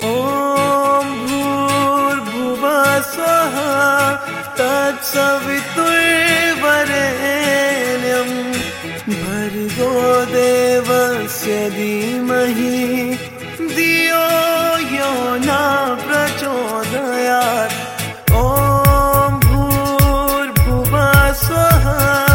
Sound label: Gayatri Mantra Mix